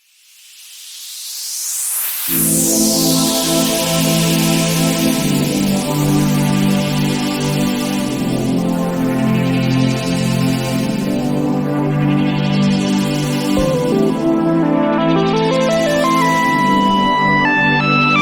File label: Happy Bug Noises; Pony Music Archive